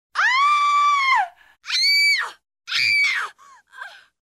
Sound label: woman screaming